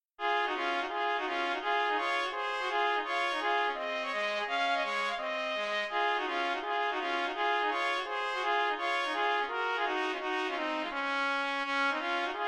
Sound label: musette in Eb major 2tpts; romain